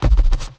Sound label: Perc, drums; Touch Loops; BlissedOutBeats